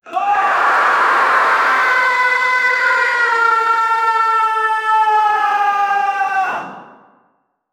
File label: a build up of piercing; asylum